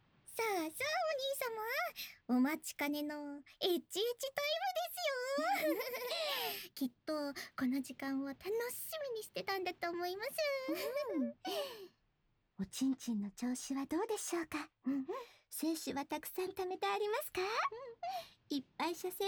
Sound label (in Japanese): 甘やかし耳舐め手コキ; 星宮の甘やかし耳舐め手コキ; ASMR